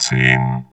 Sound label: VOX VOX, Electro Vox